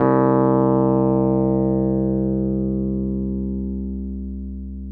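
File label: Wurlitzer electric piano; Sonic Reality - Vintage Timetraveler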